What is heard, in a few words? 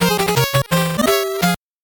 8bit jingle fast